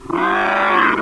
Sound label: wolt pain; sexr